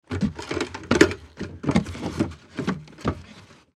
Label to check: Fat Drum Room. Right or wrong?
Wrong